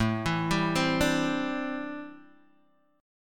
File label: A Minor 11th A Guitar Open D